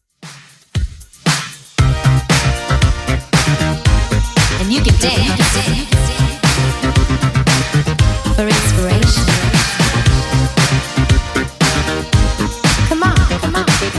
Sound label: 80s Redrum 116bpm